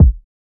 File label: Kicks